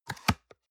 box small open medium

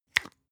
spice jar smooth close creak fast; industrie wirtschaft; gastronomie

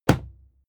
fight impact sweetener punch medium; krieg-waffen; nahkampf; soundpool

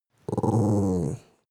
dog small growl short; natur-tiere; hunde

